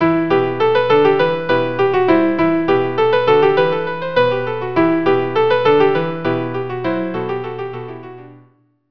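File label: violin piano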